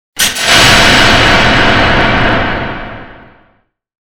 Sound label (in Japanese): 扉